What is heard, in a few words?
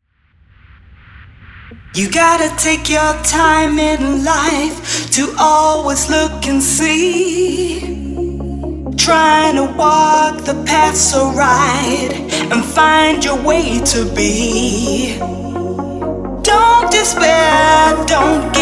Radio Mix